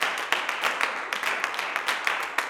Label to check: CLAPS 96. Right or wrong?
Right